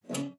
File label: PLOK B3, SPINET; Xsample VOL-7